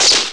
Gun Shot; Sound Machine

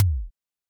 ele Drum

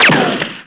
bullet; alienbreed3d2

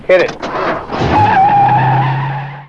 level Start; F.A.R.M. Patrol

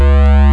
found none sound; Galaxis

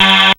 pad blip, synths, dancerap; The Sample Bank

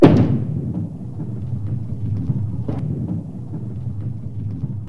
ROLLING; Ancient Asian Sex Secrets

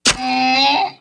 donkey die; Immortal

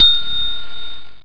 ping

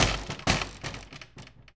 window bang; The Sum of All Fears

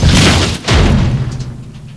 ROLL; mtm2demo; PC Gamer 4.2

